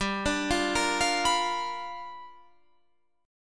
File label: G 7th Sharp 9th G chord